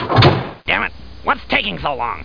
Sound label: latch, doors